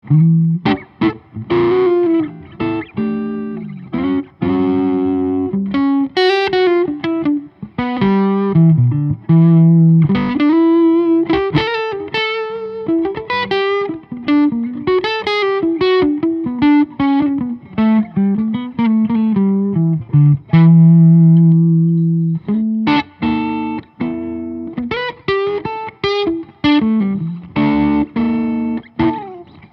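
Leach Dragon overdrive, electric guitars, solid body; 1998-2000; tw doyle pickups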